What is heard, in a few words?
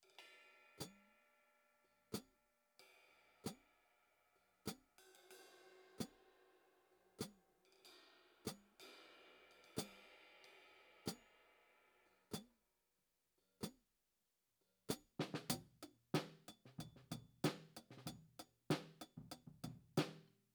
HiHat, 94bpm